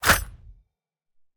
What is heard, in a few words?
scorpion attack claw; smite; npc